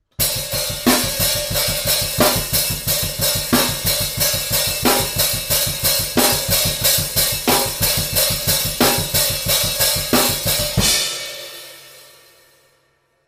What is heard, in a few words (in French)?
rythmes incontournable, batteur